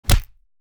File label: Fight Impact; SoundsCrate